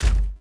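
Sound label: droid bodyfall lg dirt; droids robots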